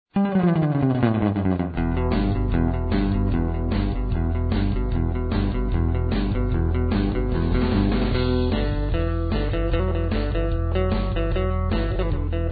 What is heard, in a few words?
Guitar Instrumentals Surf; blues keyboards